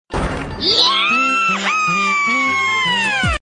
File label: yaaaahaaaaa